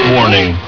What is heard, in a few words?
warning